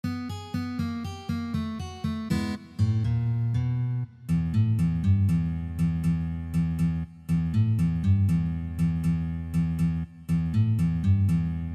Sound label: Blues Tune Level 2, guitar